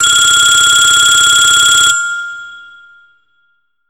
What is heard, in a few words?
midi tone, ringtones; Kyocera Cadence LTE